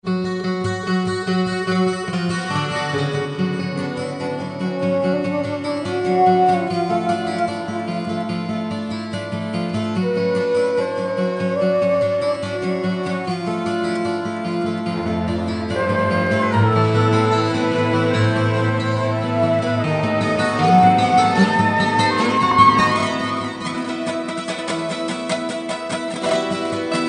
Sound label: Tristram Guitar (Orchestra); Diablo Anniversary